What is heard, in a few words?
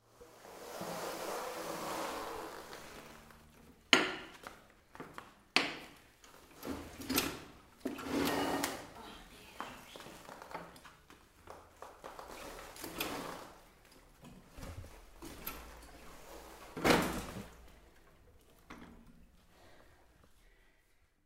Foley